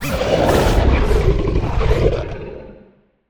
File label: Digital Creature Scifi, Monster Sounds; TheDeclineOfWarriors; Monster Sounds Volume II